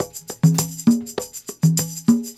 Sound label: Percussion, R&B Drums